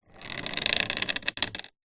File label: household lamp wall mounted metal bendy arm movement bend creak; zapsplat